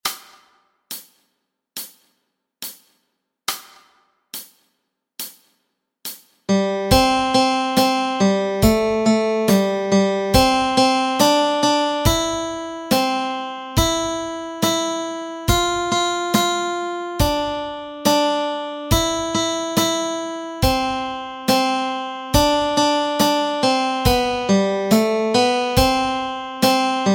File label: Bingo Melody Track